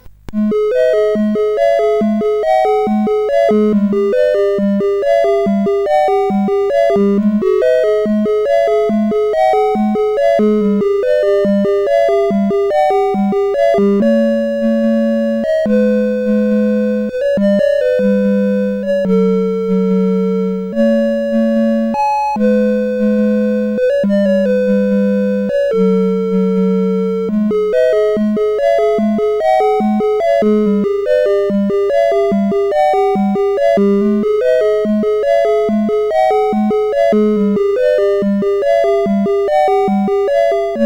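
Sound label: Nautilus, MOS 8580 SID chip music, games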